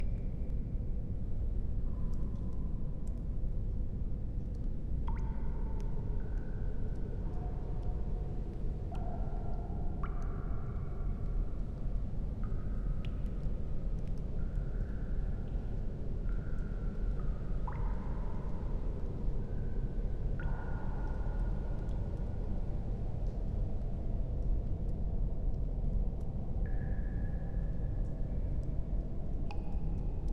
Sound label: ATMO EERIE Cave, Water Drips, Emptyness, Howling Interior Wind, Oppressive, LOOP; Articulated Sounds; Ghosts Return